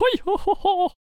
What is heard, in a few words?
Chariot Attack Vocals